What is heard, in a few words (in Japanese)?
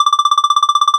会話; nes retro talk sound effects